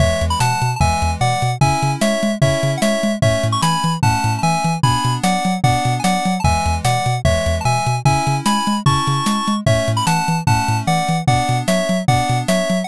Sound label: bgm street